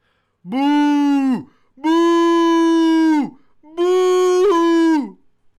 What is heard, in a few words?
bouuuuuuuh